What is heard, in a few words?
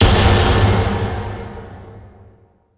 3d click